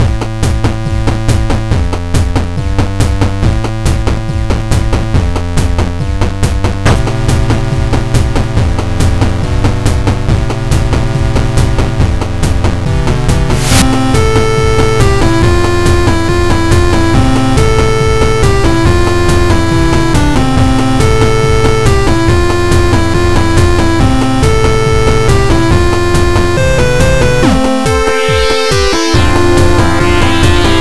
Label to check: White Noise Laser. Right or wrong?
Wrong